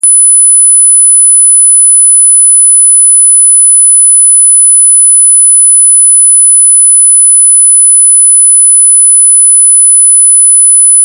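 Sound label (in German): nerviges Piepsen, 10 kHz tone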